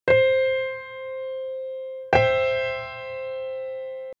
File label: Piano Comp Test